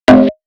Snares, Alesis Performance Pad

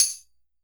Roland MV8800 percussion

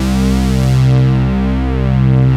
FATTY SYN, synths; Pro-Rec - Synthsonic

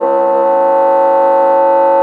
MULTI, bassoon; Xsample VOL-6

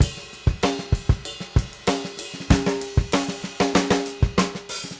Drums Fill, 70s Analog Funk; Sonic Foundry (Sony Creative Software); What it Is!; Slinky's Place

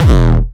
909 Grime D#1, Kicks; Drums; MBase 11 - 909 Grime

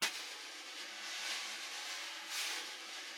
Vintage Snare Drum

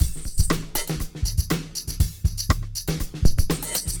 Drama Drums (120BPM); musicradar

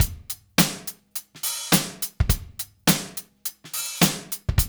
DRUMS, Funky Ass Loops; East West

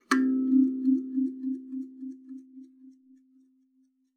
KALIMBA C4; Xsample VOL-7

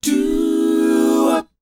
DOWOP F#, Vocal R&B DOO WOP; ILIO; Vocal Planet

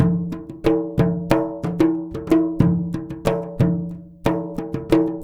MLY DRMS; Spectrasonics - Heart of Asia CD2

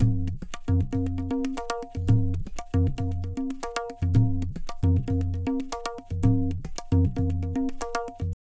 tabla, 115 bpm; Zero-G; Pure Tabla

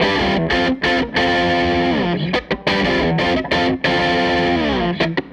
Les Guitar Loop

